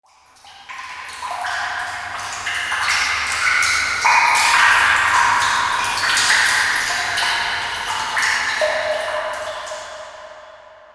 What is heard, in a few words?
Dungeon Water Drop; EnvSound